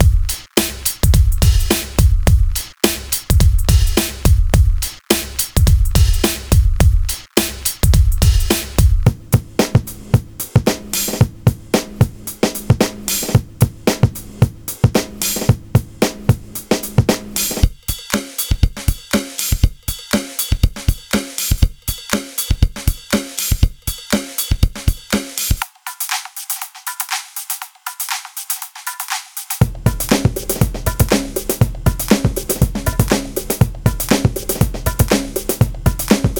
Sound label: funk and hop